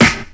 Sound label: hip hop snare drum sample b key, snare drum tuned to the B note; 90s; snare drum samples